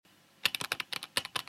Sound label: typing; hyperframes